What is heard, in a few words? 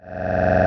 Vox; The Weird Side Samples; 99Sounds